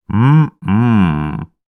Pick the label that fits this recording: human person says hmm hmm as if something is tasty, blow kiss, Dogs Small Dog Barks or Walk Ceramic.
human person says hmm hmm as if something is tasty